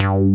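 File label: JX MOOG BASS; Pro-Rec - Synthsonic; TRINITY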